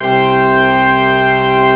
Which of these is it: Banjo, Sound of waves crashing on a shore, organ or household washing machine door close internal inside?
organ